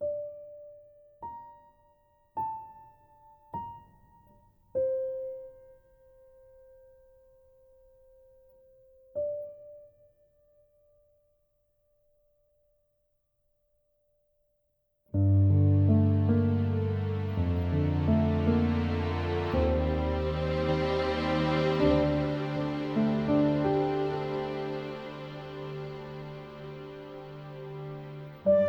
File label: Sad Ballad